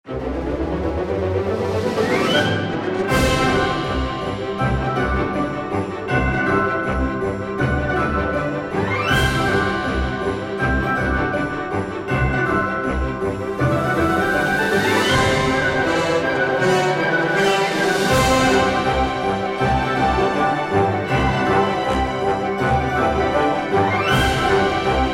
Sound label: Winter Penguin Race; Kul Tiras